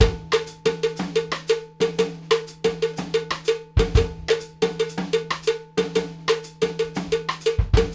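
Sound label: 6/8 cowbell + tom, left; Sampleheads - New York City Drumworks VOL-1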